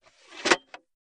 Tripod Leg Telescop

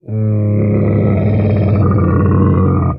Camel Groan Single; camels

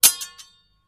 Sword Clangs Sword, swords

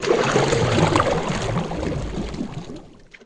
Canoe Paddle Stroke Underwater

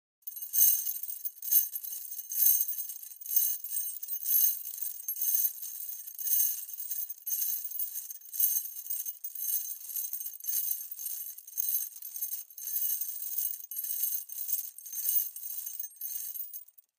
Small Chain Movement

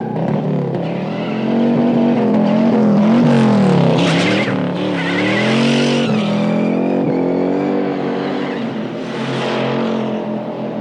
Mustang Guns Around Corner

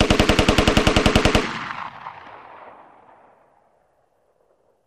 Machine Gun Sngl Rap, single burst

